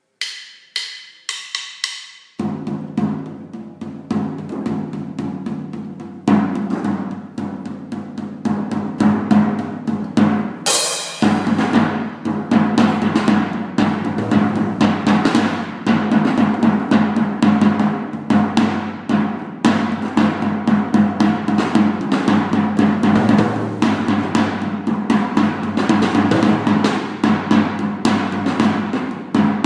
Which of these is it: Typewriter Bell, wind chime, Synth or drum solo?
drum solo